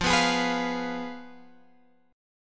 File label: F Diminished, Guitar, Open G; down; chord shape